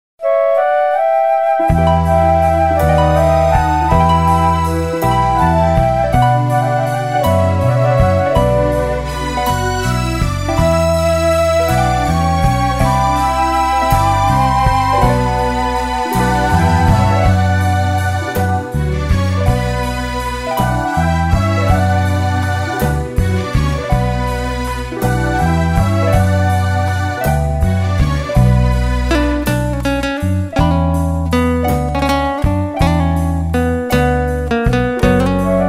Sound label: Guitar